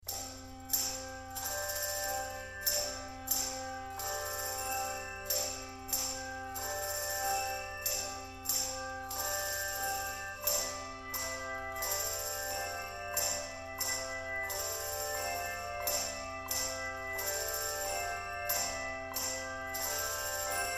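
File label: Handbells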